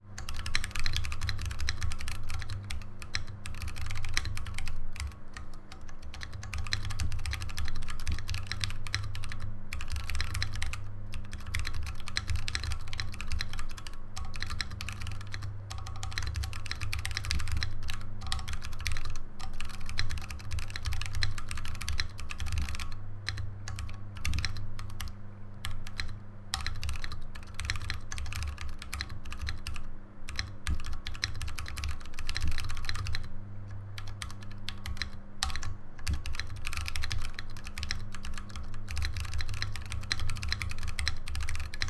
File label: keychron q1 brown typing test